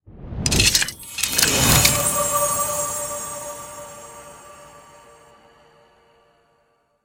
bonus Progress Key Anim; netent; bloodsuckers2

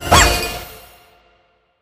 cat wild; netent; copycats

